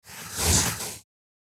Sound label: Pencil writing a single line